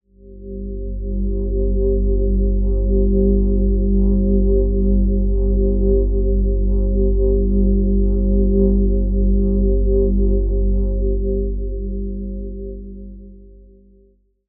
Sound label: Pad; Routed Sound; Sylenth1 Expansion - Quantum Enforcer Vol.1